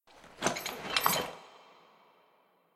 anim exit happy

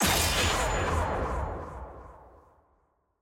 ui gameplay knockout

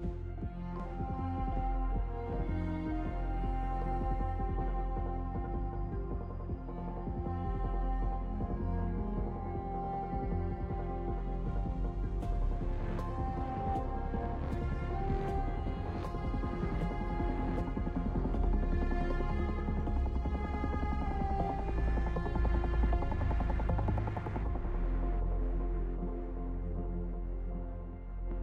mood loop

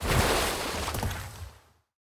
water emerge

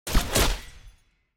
action attack

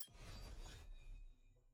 ui market skin hover

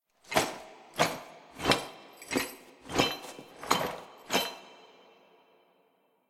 walk creep

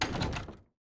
action locked door

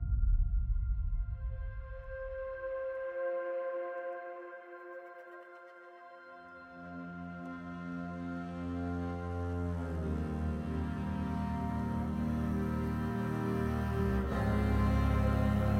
theme

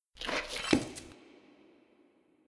walk workout squat out phase

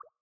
item glow hover

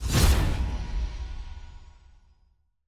missions intro

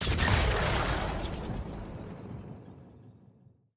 perks keystone

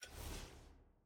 sfx ui archive hover; jinx

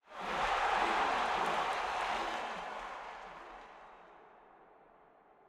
crowd chant

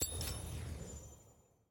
settings block list unblock success

